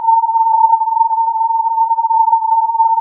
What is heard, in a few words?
filtered input